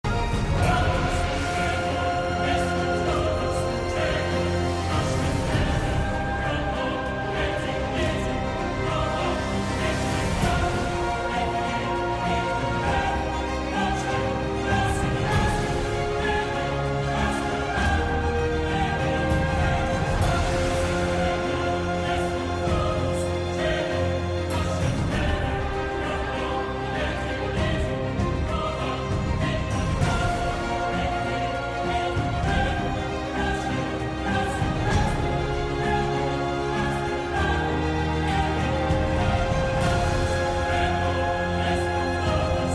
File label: cinematic, cinematic orchestra with epic drums